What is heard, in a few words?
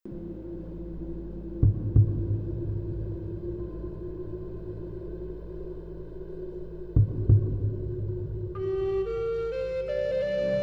flute 432 hz